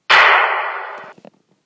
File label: pistol